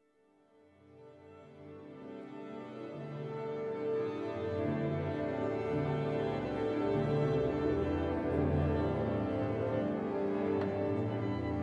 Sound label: violin concerto in d tuning and applause; ludwig van beethoven; op 61